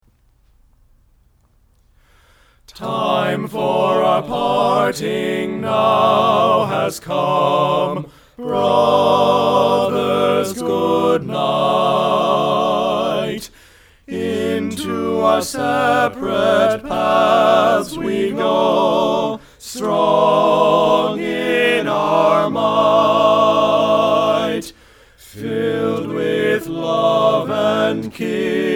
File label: Parting Song, Bass soft; Sinfonia